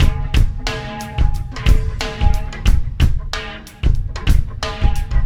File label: Landing Party Beat, Processed Drumkits